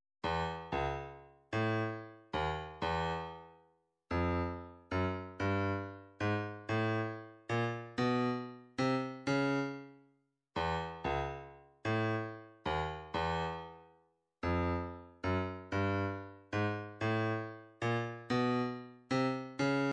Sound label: separated elements snare; 120 Slide